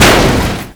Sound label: impact soft, physics; gmod; lvs framework